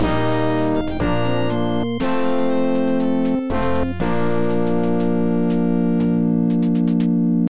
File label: Victory; Ultima Underworld The Stygian Abyss; IBM PC AT